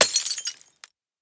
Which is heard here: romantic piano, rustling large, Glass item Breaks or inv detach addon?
Glass item Breaks